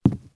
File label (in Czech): bouch; Mafie 1